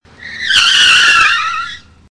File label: Car Brakes Squeel